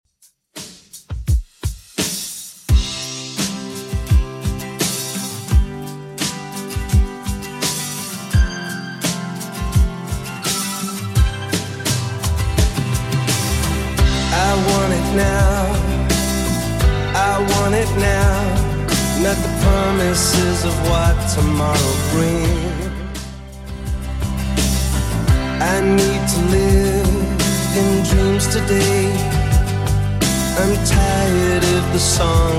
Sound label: 80s Redrum 85 bpm